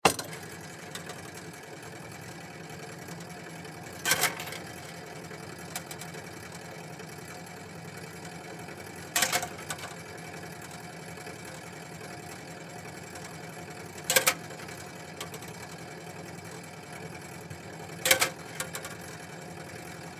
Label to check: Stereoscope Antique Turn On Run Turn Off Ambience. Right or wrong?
Right